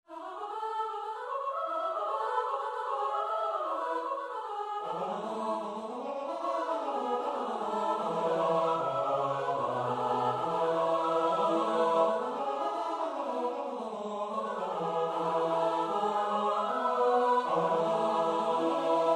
S-A-T-B, koorpartij oefening; Britten (Benjamin); Hymn to St. Cecilia Op.27

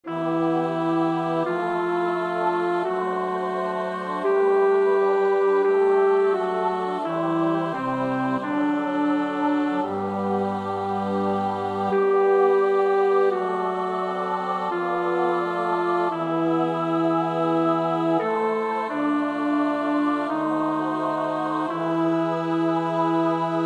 bas; Bach (Johann Sebastiaan); BWV 119

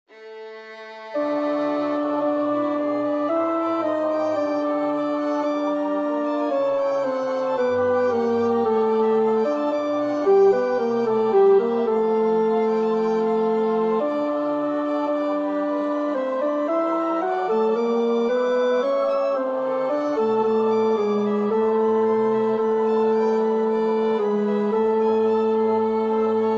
tenor, koorpartij oefening; Reger (Max); O wie selig seid ihr doch, ihr Frommen

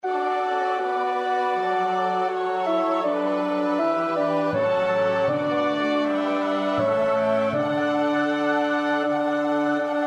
tenor; Bach (Johann Sebastiaan); BWV 029; 7 Sei Lob und Preis mit Ehren

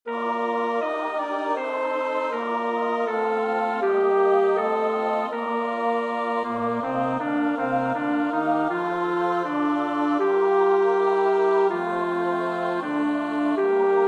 bas; Bach (Johann Sebastiaan); BWV 013; 6.So sei nun, Seele, deine

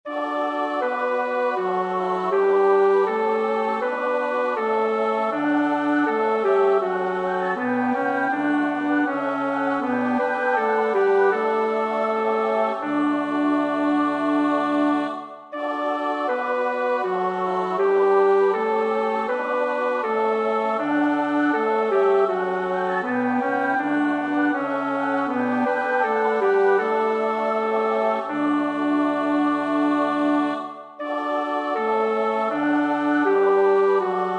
bas, koorpartij oefening; Bach (Johann Sebastiaan); BWV 184; Herr, ich hoff je, du werdest die

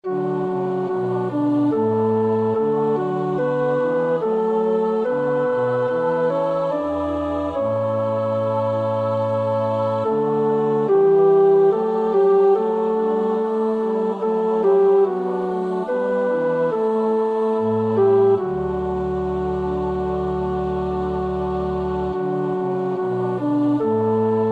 tenor, koorpartij oefening; Bach (Johann Sebastiaan); BWV 011; 06.Nun lieget Alles unter dir

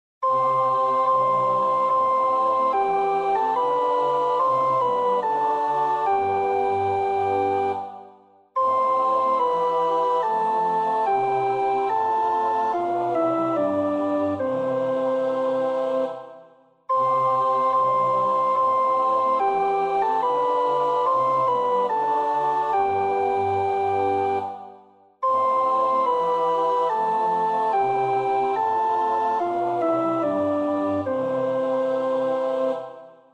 sopraan, koorpartij oefening; Telemann (Philip); Ein feste Burg ist unser Gott

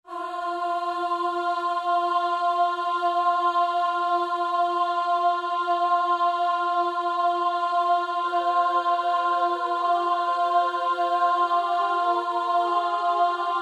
bas

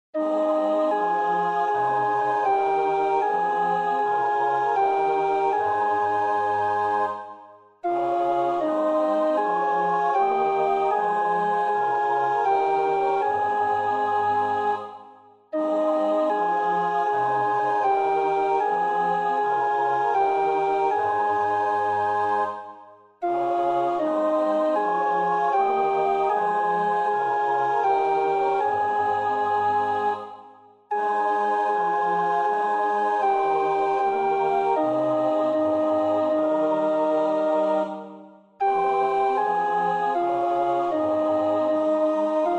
alt, koorpartij oefening; Graun (Carl Heinrich); Der Tod Jesu

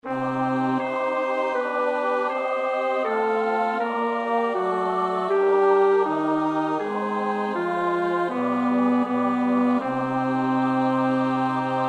bas; Bach (Johann Sebastiaan); BWV 073; Das ist des Vaters Wille

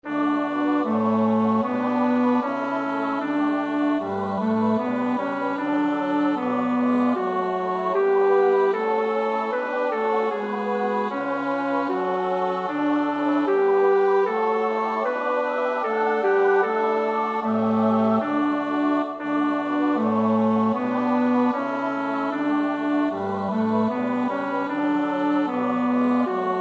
bas, koorpartij oefening; Bach (Johann Sebastiaan); BWV 174; Herzlich lieb hab ich dich, O Herr

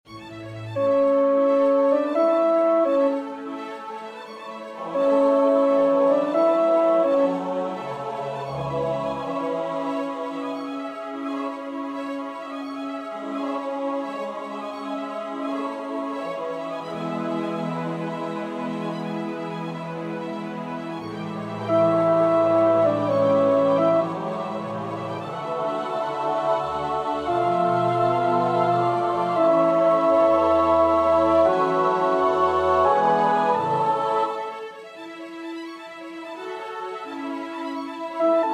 alt 2a, koorpartij oefening; Stanford (Charles Villiers); Requiem op.63; Offertorium

